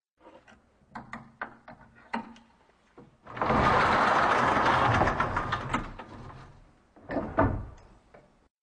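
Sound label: Door movement on rails; Dvizhenie dveri na polozkah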